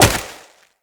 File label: Wall Jump Snow, Footsteps; Wall Run; Characters; Tenno